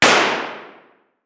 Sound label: Weapon Gun Pistol 9mm Glock Single Shot Interior Shooting Range; AdobeCC